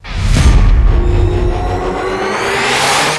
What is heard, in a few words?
Boss Music Intro Stinger; Mighty Doom; Android